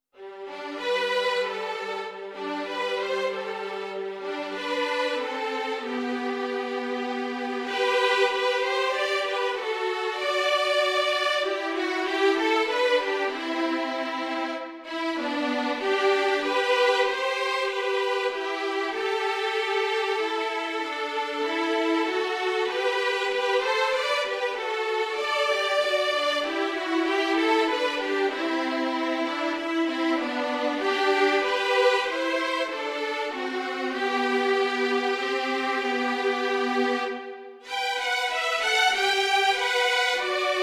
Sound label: violin duet